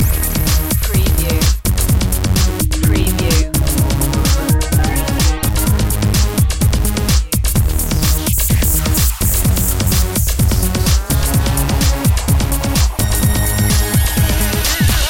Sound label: loop